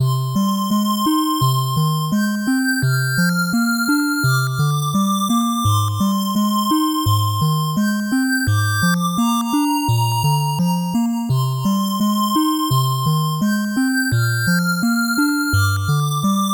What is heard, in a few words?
8bit bgm normal